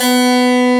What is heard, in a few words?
HARPSICH